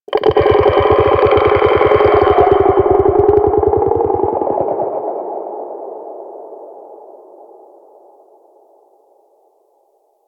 Infested Golem Vocal Idle; Warframe

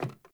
Sandals Run Wood, Footsteps; Characters; Player

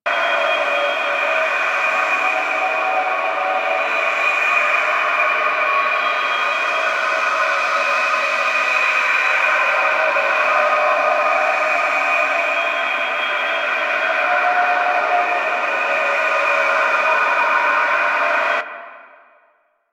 Tube Vent Loop; Ambience; Props; Warframe; Entrati

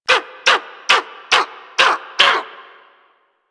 Bird Of Prey Vocal Idle Beg; Warframe

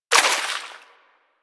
Bullet Impact Debris Water

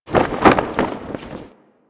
Flag Flap C; Warframe; Ambience; Grineer; Grn Flag Flaps

